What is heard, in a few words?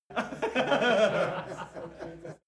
laugh male loud